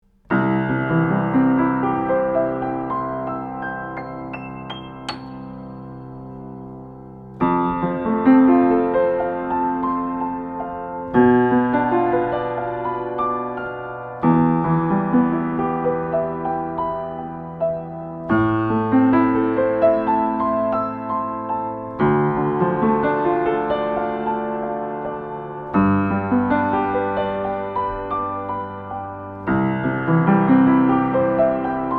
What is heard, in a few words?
XY Stereo Above Piano